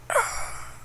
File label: pain, csgo french bot; csco